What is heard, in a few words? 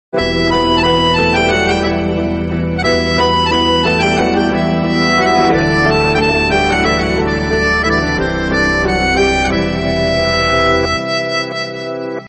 bollywood lovely song instrumental tone ringtone; bollywood hit; bollywood ringtones